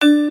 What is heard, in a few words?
step; Hot Scatter Dice